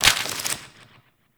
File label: Grimoire Mech; Warframe; Tenno